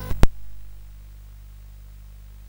sid CSG8580R5; Gryzor; hvsc

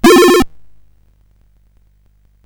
sid CSG8580R5; hvsc

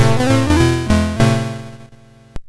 sid MOS6581R2; hvsc

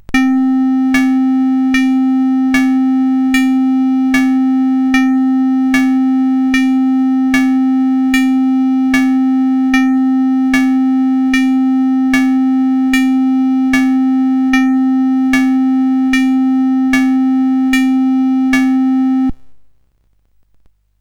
Dripper, CSG8580R5; hvsc

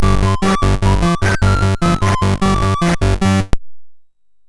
sid MOS6581R3; hvsc

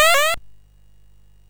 sid MOS6581R2; Gryzor; hvsc

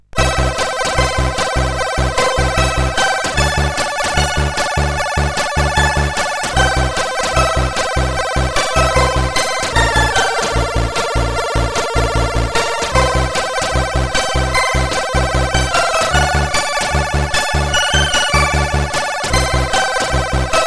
sid CSG8580R5; Moonshadow; hvsc

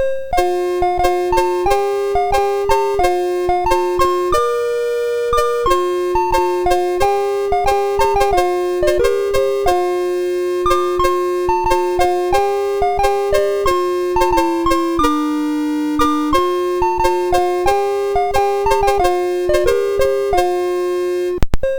BASIC, MOS6581R2; hvsc; sid

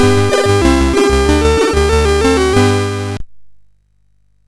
sid MOS6581R2; hvsc

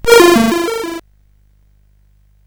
sid CSG8580R5; hvsc

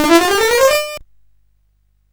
sid CSG8580R5; hvsc

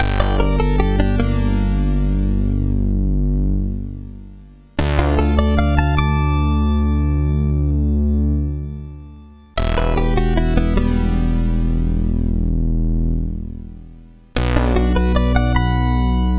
Metal, sid MOS6581R4; hvsc

